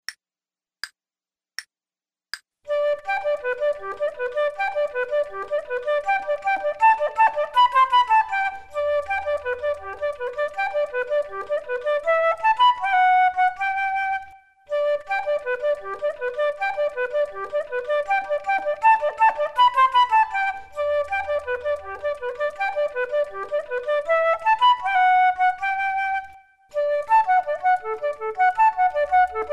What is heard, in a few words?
flute; Selected Duets for Flute Podcast